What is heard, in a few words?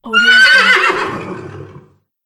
horse